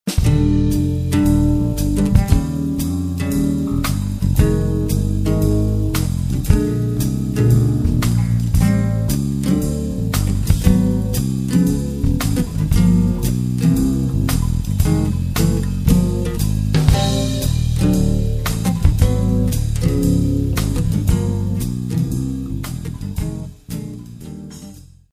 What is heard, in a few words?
Tune Up